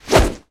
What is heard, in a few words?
Ice Deer Action; Seer; GamigoNA